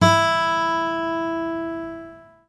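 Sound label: guitar acoustic; Sound & Vision; Gigapack I CD 2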